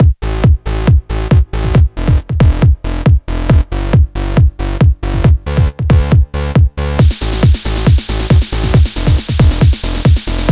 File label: Electronic mix